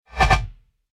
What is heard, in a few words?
whoosh tube more dynamic